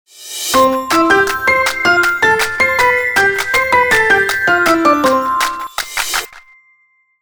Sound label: children logo